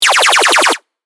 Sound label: lasers shoots; sunbeam sundays